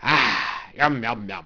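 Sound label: male yum; People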